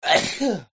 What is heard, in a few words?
Sneeze